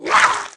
Mutant Swipe Attack; Valleakan Mutant 1; Aliens

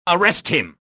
anger

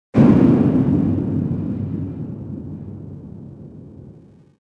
metal thump, cargoliner; Soldier Of Fortune II Double Helix; XBOX; ambience

